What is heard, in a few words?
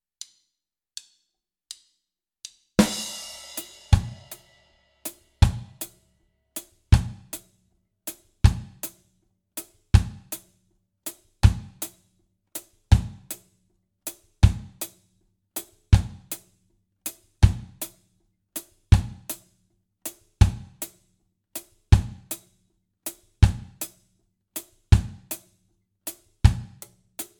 basic reggae one drop feel